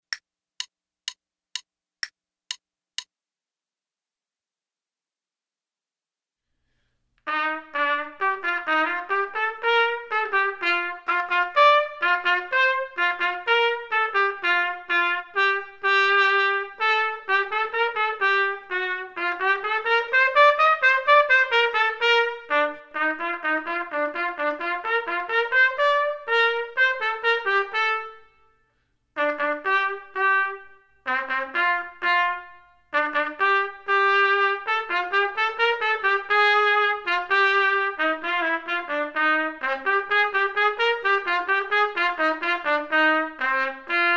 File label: trumpet 2, Lustily; AABA musical pattern; metronome setting for this performance is quarter note = 92; Selected Duets for Trumpet, Page 18 Number 15, Lustily, performed by David Summer.; Trumpet 2 Only